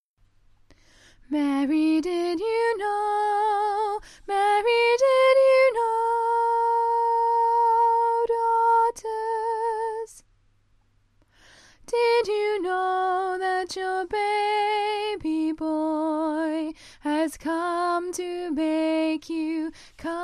soprano, choir

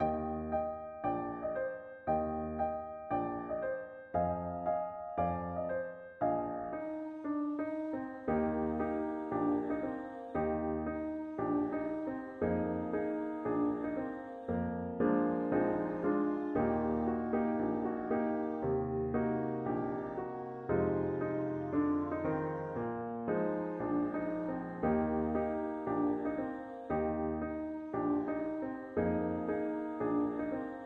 New York Medley backing, choir

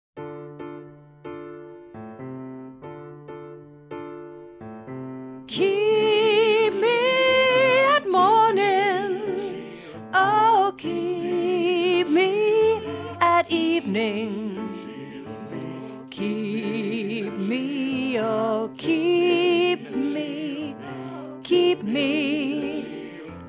Soprano learning track

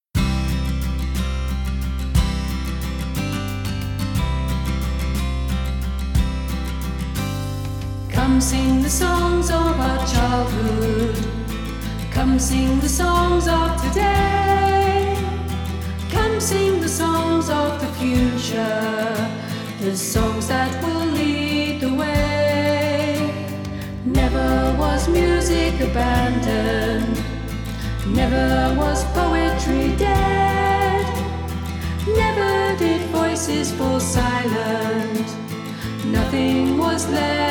SSA alto

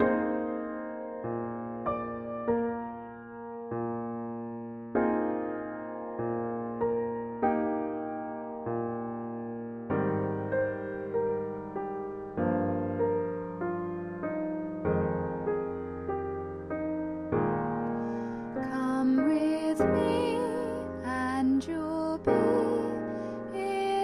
soprano

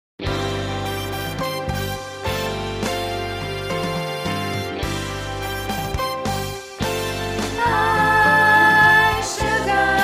soprano

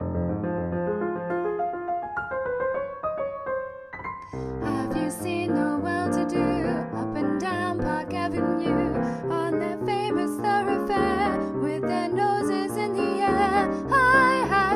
alto

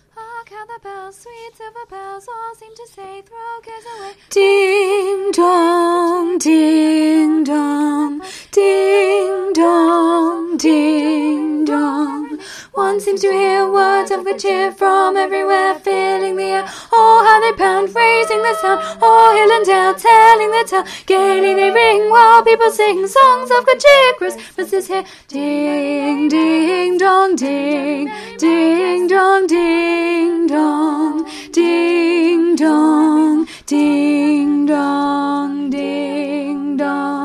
alto

